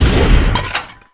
Flame Thrower Shot End Eject; Weapons

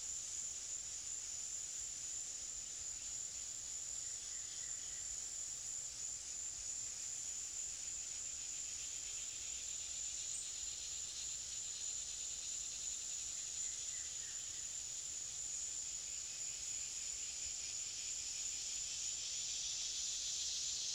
Cicadas - Forest - looping; RadwinIsland

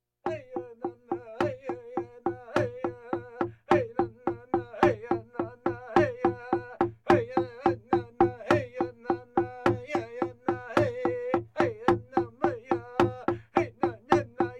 Percussion Bongos Tympani rolls Gongs Drums; Valentino Production Sound Effects Library CD50

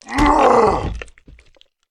zombie hit

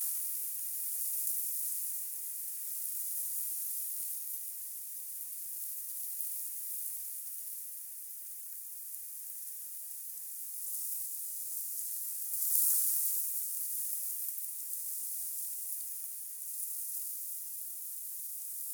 Leaves; blindside

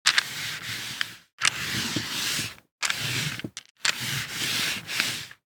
DICE on Felt, Slide, Standard, Six Dice; Samples; ArticulatedSounds; DICE Sfx Party (Extended)

